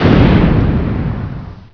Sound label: explosion; hurrican